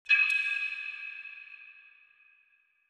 sword click; soundscrate

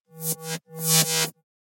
electricity hum short; SoundsCrate

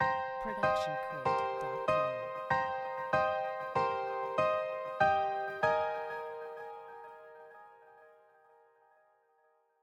Corporate Ident Lights; SoundsCrate

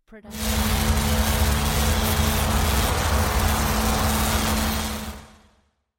dark magical energy; soundscrate

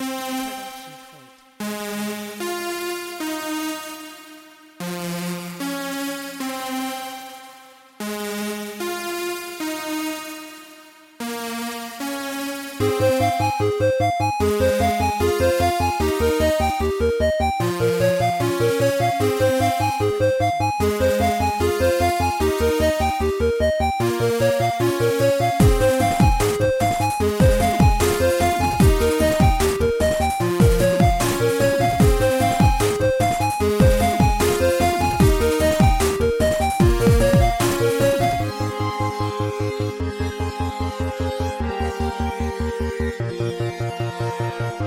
Chiptune Breeze; SoundsCrate

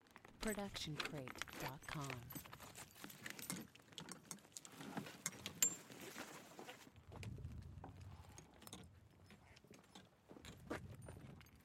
golf bag handing stereo; soundscrate

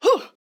woman injured grunt

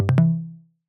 click fx tonal; soundscrate

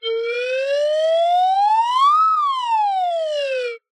slide whistle long crescendo

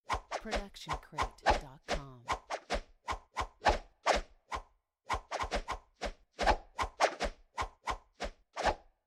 arrow swooshes multiple

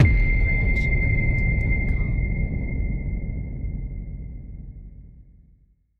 cinematic hit pin drop explosion; soundscrate

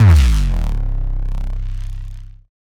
Bass Drop; SoundsCrate

